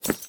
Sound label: crystal run, step; blocks